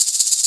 Maracas Shake; 300 Drum Machines; E-MU MoPhatt

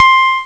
Aco Piano, Amiga Soundtracker; ST-XX Amiga Soundtracker Sample Packs

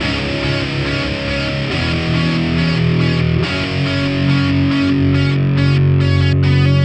Distorted Guitar 140BPM C#min; Distorted Guitars; Vengeance - Essential Clubsounds Vol.4; Guitarloops

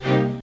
orch shot, house; Crypton - DrumBase5000; HOUSE #1

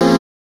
chords

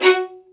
F#4, LOUD VIOLINS; Q Up Arts; Denny Jaeger Master Violin Library

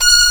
VIOLIN, E.POP

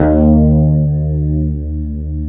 E 2 STRAT MF, HYPED STRAT, Guitars; Spectrasonics; Hans Zimmer Guitars VOL-1; 90's Sample CDs

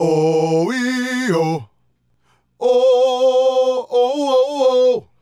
Male Group, Hip-Hop and RnB Vocals

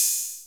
909 CYMBALS

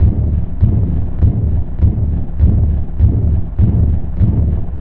tabla, 100 bpm; Pure Tabla; Zero-G

